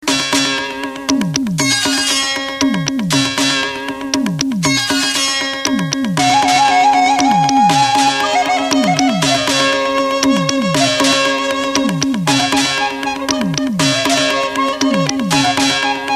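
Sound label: Classic Flute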